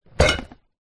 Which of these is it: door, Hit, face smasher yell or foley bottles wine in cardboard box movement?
foley bottles wine in cardboard box movement